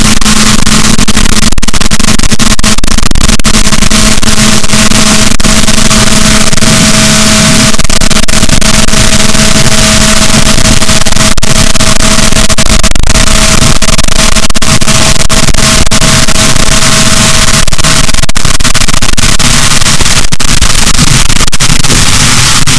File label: rorquals, Balaenoptera Physalus, boat